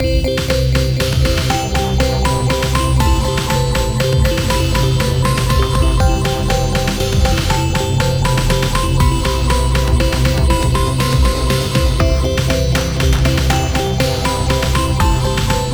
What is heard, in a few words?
march